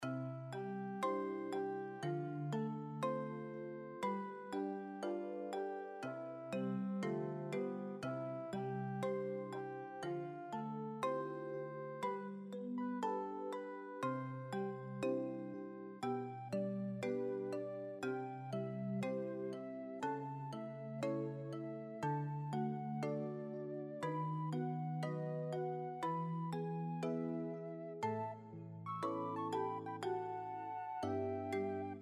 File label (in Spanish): musica premio; mekanta